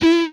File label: Sci Fi Gun, Blast; Space Blasters2D